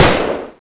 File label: AVS36